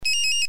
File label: Motorola Startac